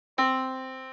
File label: yamaha Disk